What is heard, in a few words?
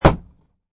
Wood Hit, Hitting a piece of wood; Hit Sound Effects; Sound Effects:Objects